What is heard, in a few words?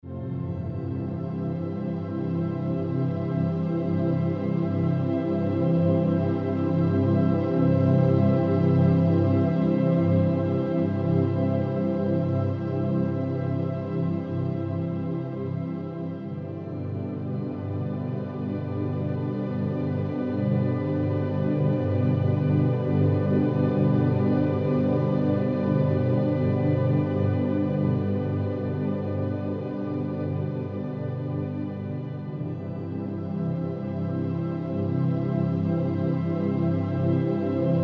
lemuria healing sounds